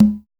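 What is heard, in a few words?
jungle conga; Drums In Your FACE!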